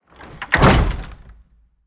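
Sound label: wood double light close, door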